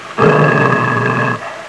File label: dog growling; pets; Sound Effects for Multimedia - Volume 1